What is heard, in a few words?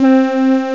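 polysynth pad; gmplay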